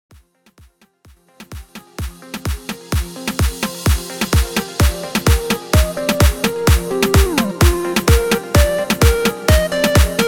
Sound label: BIG ROOM; 128 BPM